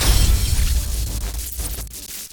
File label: match card